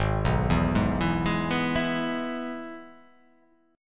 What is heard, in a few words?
G sharp Minor Sharp 5th; 4,2,2,1,4,3,1,1